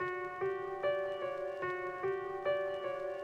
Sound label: Lofi